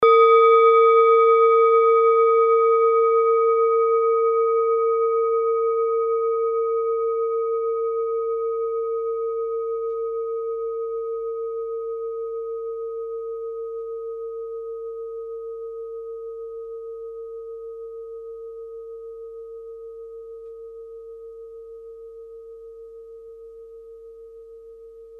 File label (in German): kleine klangschale; Diese Klangschale ist eine Handarbeit; Planetentonschale: Metonischer Zyklus; 3.Chakra (Solarplexus Chakra)